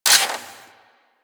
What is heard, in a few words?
Harpoon Gun Mech Stop; Warframe; Sharkwing; Grineer